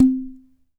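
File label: KAE KEEKE, HAWAII PERC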